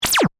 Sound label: Laser Gun Fire, Shot; Science, Fiction; Laser Sound Effects